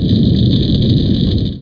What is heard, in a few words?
furnace; nihilist